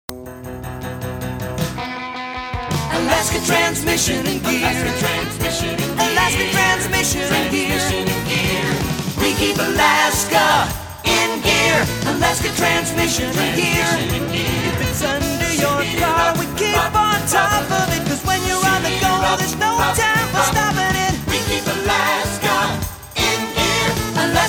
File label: jingle